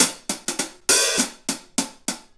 Hi Hat Pattern

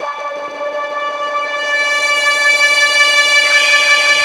HOLD BRASS

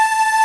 Ending loop

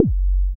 BD ANALOG; Sound & Vision - Gigapack II CD 2

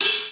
Hi-Hat

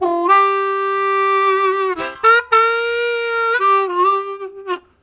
Gliding, Harmonica; Zero G

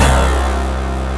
TR HD ATK, HOUSE

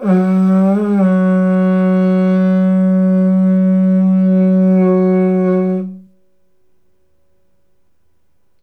TUSK, IVORY HORN; AKAI S6000 CD-ROM; WorldSounds

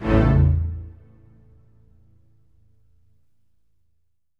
ORCH HIT; Q Up Arts; Denny Jaeger Private Collection

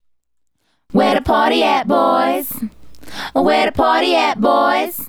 Female Group, Hip-Hop and RnB Vocals

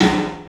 PA DEEP R, CLUB SN VRB; 3D Sound; 3D Drums

